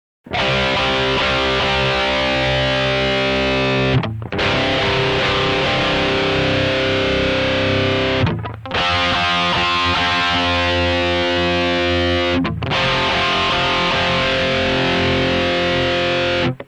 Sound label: power chord